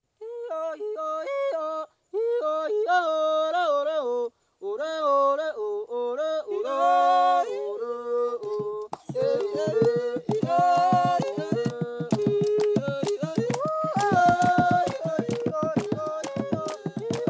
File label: Aka Divining Music